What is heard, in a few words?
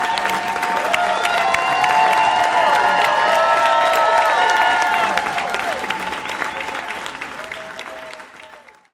cheering; raucous cheering